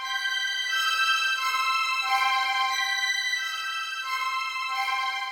Descent

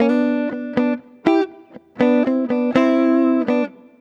A, jazz

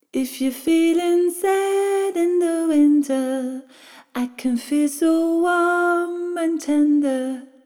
Feeling Sad; Jazz (Джаз)

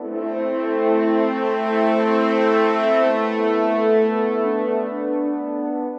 Horns Ens; brass

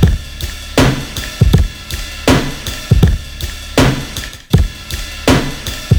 Perc Rider B; Ударные и барабаны (Downbeat); 80 BPM; Downtempo; Drums; даунбит

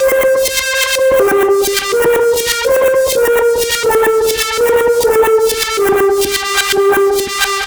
Brookeline, break beat

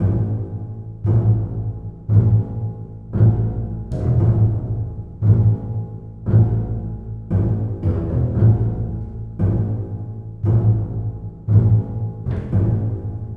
bass pizz; средневековая мелодия

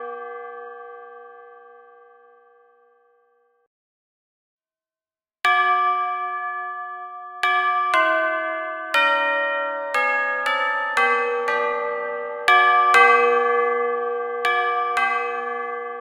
Amin Bells

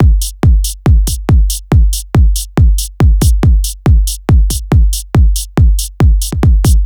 Mini Beat, драм техно транс

